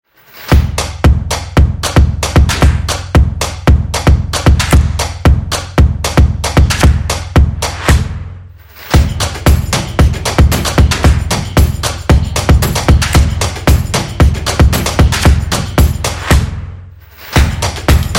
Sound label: Drum Upbeat; percussive nation vol 4